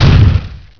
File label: Step; tbeast